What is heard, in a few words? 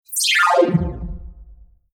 Bubbling Down Long Digital Game Computer Scifi Digital; Sound Design; Digital Sound Effects